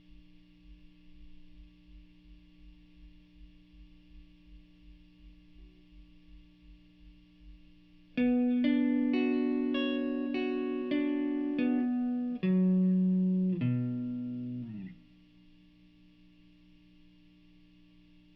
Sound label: GTR